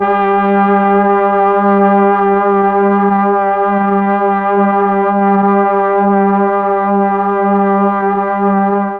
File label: G3 BRASS, mellotron; HALFSP.BRASS